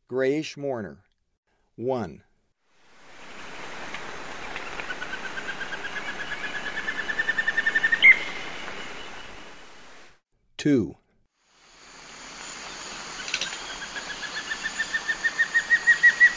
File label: Grayish Mourner; S. America; Eastern Lowlands